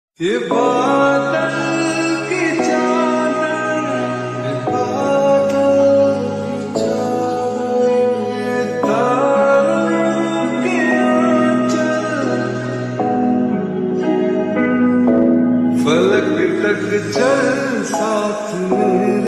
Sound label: lofi song